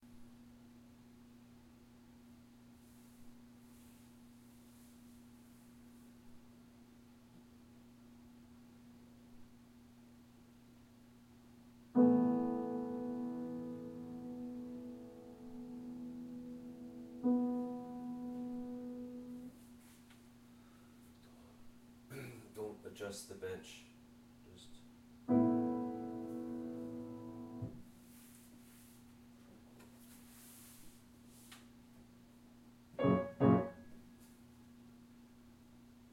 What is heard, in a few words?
MUSIC IMPROVISATION EXCERPT; 43s; MUSIC IMPROVISATION EXCERPT TWO